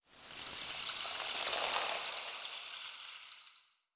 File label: Malediction Insect